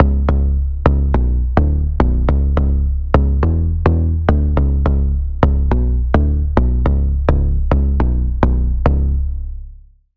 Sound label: Bass, Sylenth1; Routed Sound; Sylenth1 Expansion - Noble Gangster Vol.1